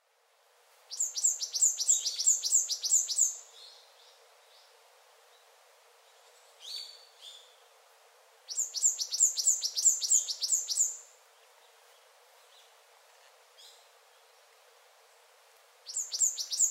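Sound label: Owston's varied tit, ishigaki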